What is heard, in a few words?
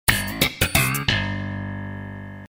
sitcom transition bass riff; seinfeld